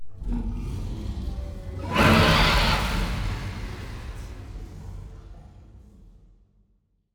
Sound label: aquatics dragon death